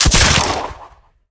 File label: crossbow shoot; crusaders crossbow; tf